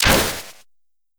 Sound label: laser cannon shot; GameTut